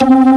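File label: organ, Vintage Keys B-3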